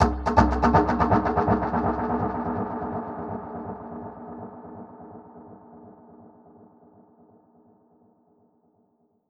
Perc Hit, dub percussion; musicradar

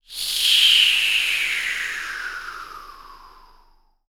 SHEW, BREATH FX, Jazz FX; Spectrasonics; Vocal Planet

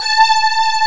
SYNTHESIZER DIGI DECAY

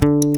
GTR SQU, Nylon Guitar

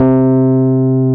piano Rhodes

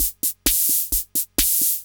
130 addon, DRUMLOOPS, HIHAT

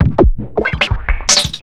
150, Big Beat Drumloops

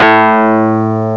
CLAV ORG

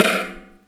Thunder Drum

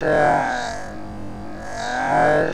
Fx Vox; Equipped Music; Loungin House

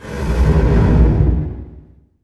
IMP FLAME, Cinematic Impact

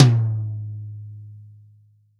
TOM, Real Drum Kit, POP KIT

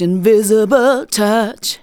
vocals sung; Techno Trance Essentials

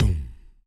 perc voice, Roland MC-909

